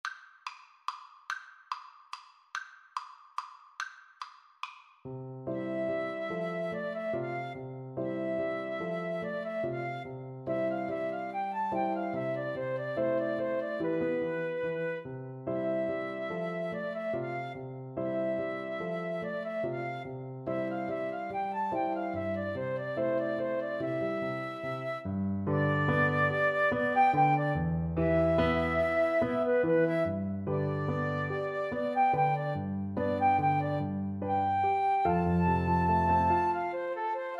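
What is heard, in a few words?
flute violin karaoke; Violin-Flute Duet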